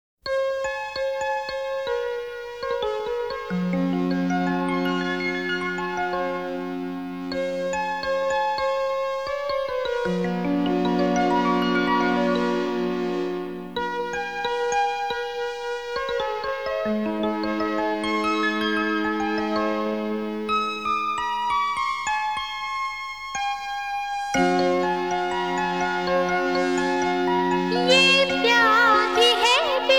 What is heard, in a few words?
Female Version; tahalka 1992